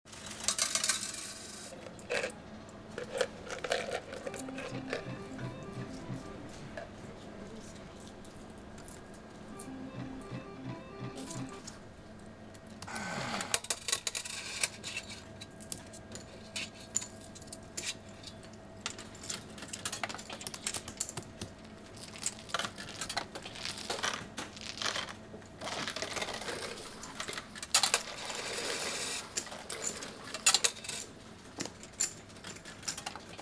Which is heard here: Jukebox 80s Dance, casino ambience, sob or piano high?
casino ambience